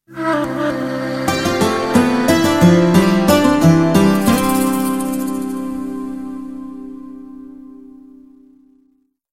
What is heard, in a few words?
nokia iranian beats ring remix, ringtones